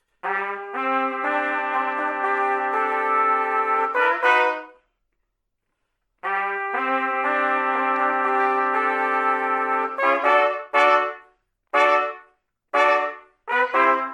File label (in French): pour 4 trompettes confinées; La grande évasion